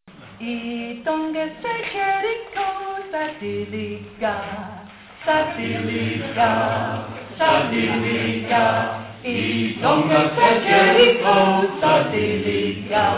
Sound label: bass